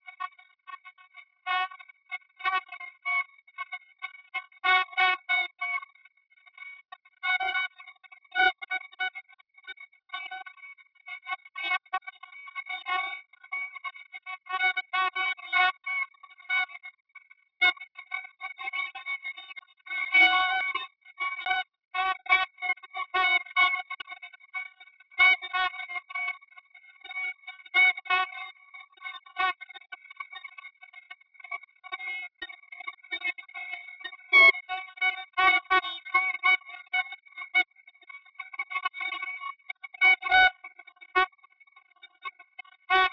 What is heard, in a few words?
Jazz